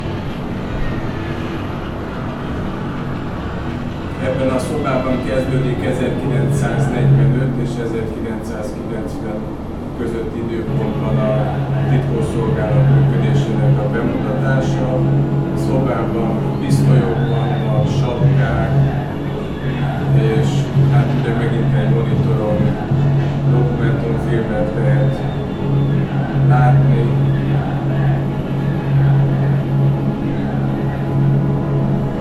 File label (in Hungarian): bunkart kiabalo tomeg